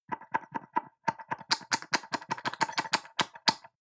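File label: soap dispenser press multiple almost empty fast, bathroom; haus-garten; badezimmer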